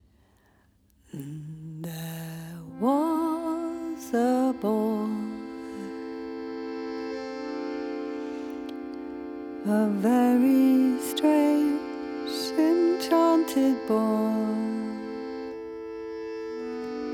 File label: no reverb